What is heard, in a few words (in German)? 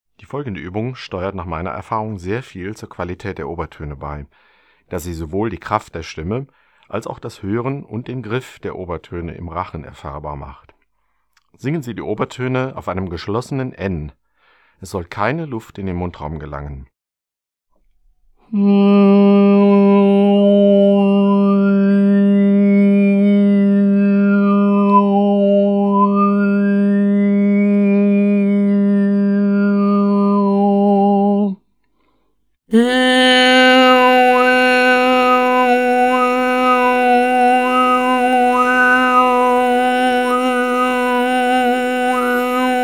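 Obertöne mit geschlossenem N; Buch Oberton Singen CD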